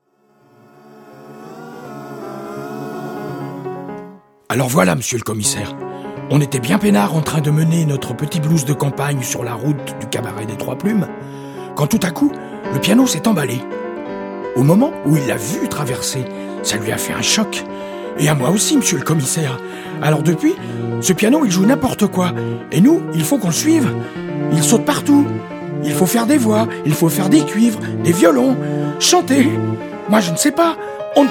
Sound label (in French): piano dingue